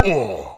hell ow; hedgewars

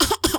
Poison Cough; hedgewars; Default ru

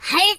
Jump, voices, Pirate

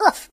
Ooff, Mobster; HedgewarsMobile

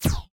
portal shot; hedgewars

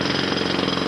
pick hammer; hedgewars; HedgewarsMobile

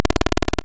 drill gun; HedgewarsMobile

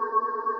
ice beam idle

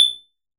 Rope hit

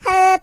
Jump, Singer; hedgewars

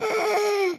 Poison Moan, voices; hedgewars; Default pl

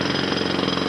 pickhammer; hedgewars

